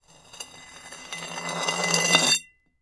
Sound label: Metal Pipe Scrape; MinorHallutinationsSounds1